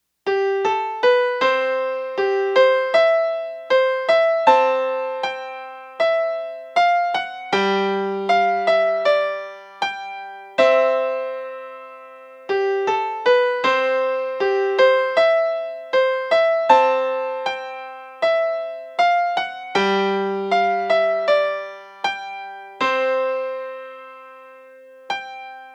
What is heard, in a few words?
B melody